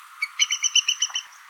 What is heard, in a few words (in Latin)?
Numenius phaeopus